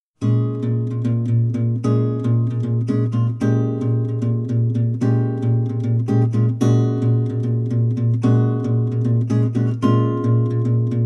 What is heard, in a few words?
Funky Waltz